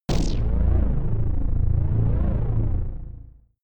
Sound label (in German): shot wavy darkness, pulsieren